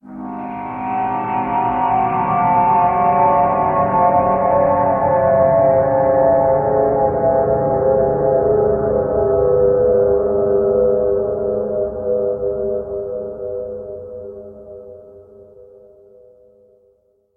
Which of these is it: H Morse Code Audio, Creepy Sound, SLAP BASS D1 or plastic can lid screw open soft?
Creepy Sound